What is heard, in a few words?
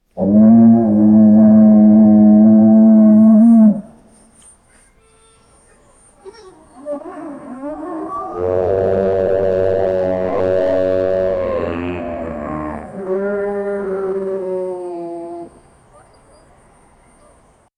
elephant, mammals; stegotetratops